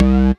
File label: DM BAS, Dance Mega Synth